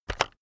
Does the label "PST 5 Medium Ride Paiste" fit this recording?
No